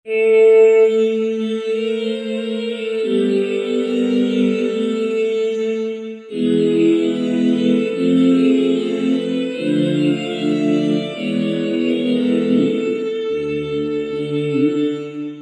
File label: Android Choir, Roland V-Synth; Factory Patches